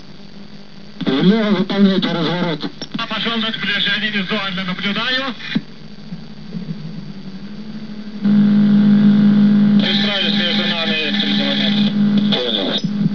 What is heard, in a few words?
landing